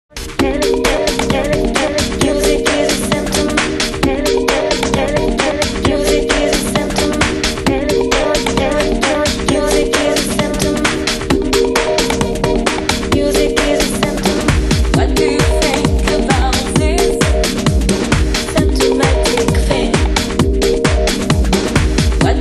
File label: electronic